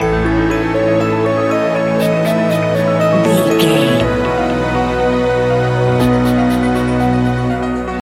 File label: magical video game level loop